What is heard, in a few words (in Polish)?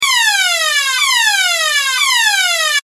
ton